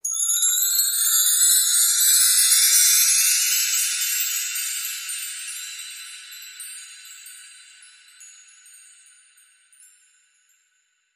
Holiday Joy Long Chimes Roll

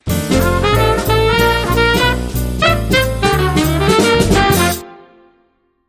Rhythm, Sting

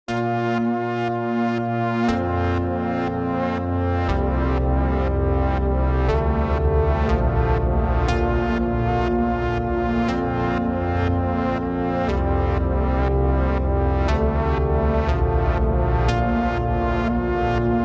Synth Theme Mix

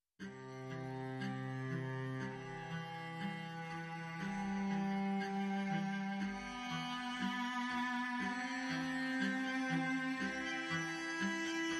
Cellos Only Mix

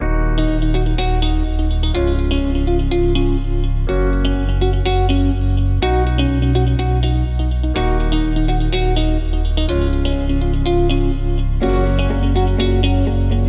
Positive Change, No Drums

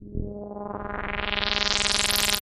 Harsh Buzz Sweep

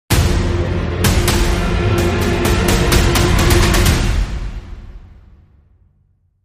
Dramatic Sting

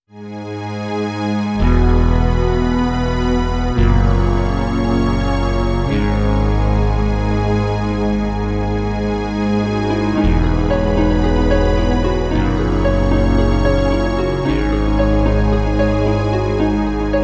Ambient

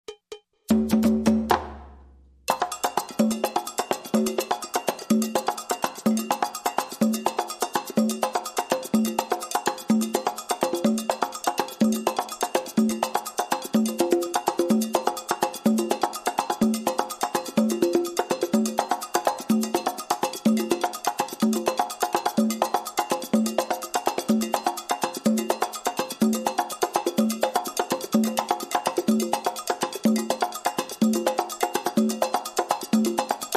Calypso Loop, Hand Percussion Only

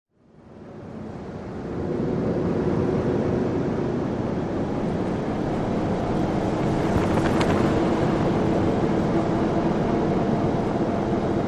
Windy Atmos; Mountain Breeze

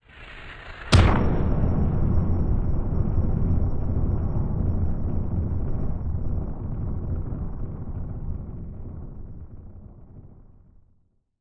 Smashy Impact And Rumble

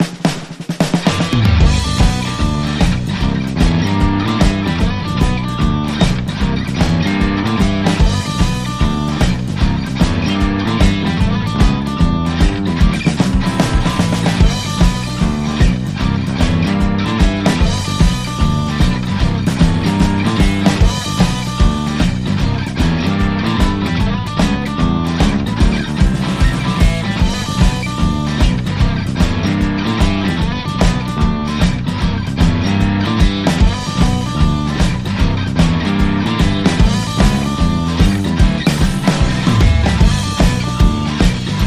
Mid West Jam, No Harmonica Alt

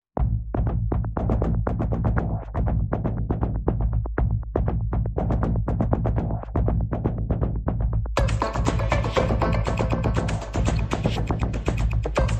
Perc and Pulses Only

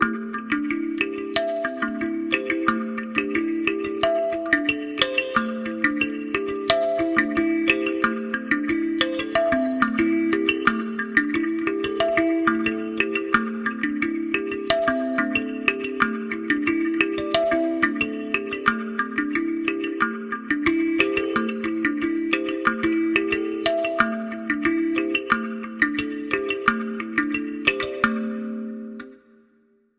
Kalimba Ostinato

